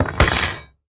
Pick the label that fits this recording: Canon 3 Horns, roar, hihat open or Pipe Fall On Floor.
Pipe Fall On Floor